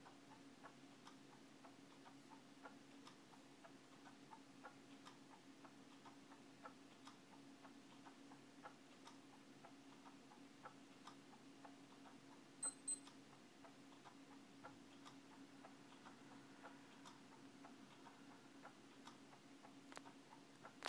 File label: time ticking away